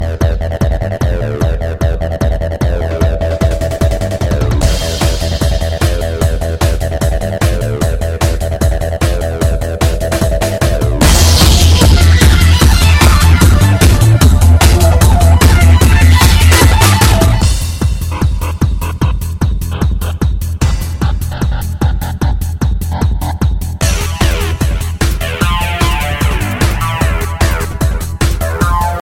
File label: classic ringtone